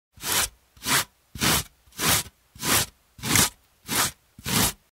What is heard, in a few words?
carrots on a grater Sound Effect; Tinder